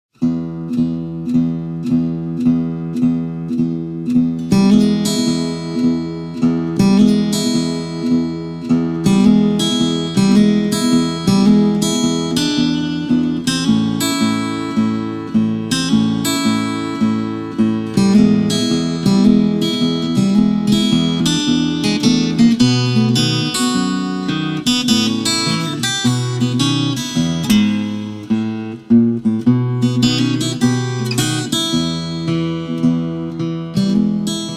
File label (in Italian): Easy Blues